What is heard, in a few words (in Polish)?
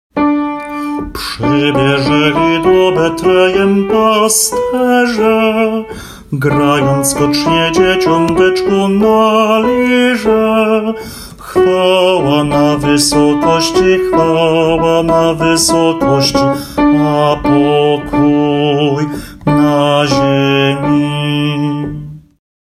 Przybiezeli do Betlejem ALT